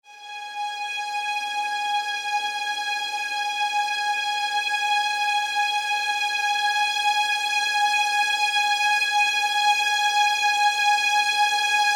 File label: High Sordino Note